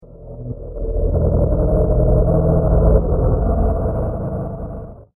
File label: Space Truck, science fiction sound effect; star wars / trek style games